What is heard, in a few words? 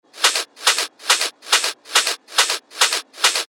techno loop 140 BPM; eRevolution; Revealed Techno Top Loops Vol. 8